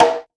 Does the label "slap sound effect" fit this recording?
No